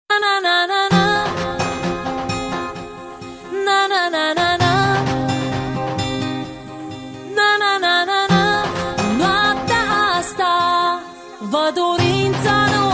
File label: theme msg alert; jazz